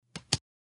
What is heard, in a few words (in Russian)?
Kartu na stol kladut